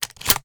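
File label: shotgun reload loop shell in; reloads; iw8; romeo870; gmod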